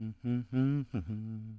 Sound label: humming; The Sum of All Fears; English Voice